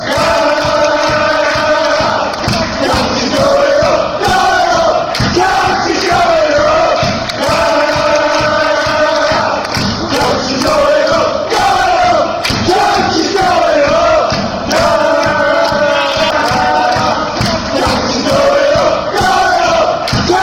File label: going up, chants; countys